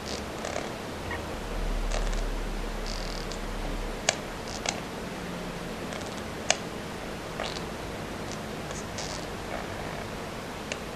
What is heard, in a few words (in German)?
brabbeln, Katharinasittiche, knackende Laute und ein dunkles Knurren; geringen Lautstärke